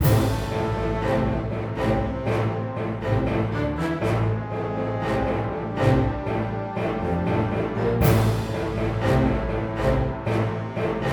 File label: Roof Loop; dark angels